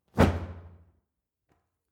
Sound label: fire torch whoosh short; swooshes-swishes